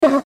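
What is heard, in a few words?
damage, basilisk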